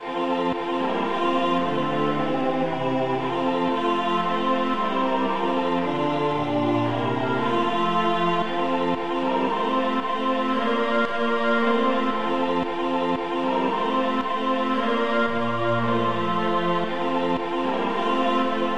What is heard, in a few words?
polyinstru; hymns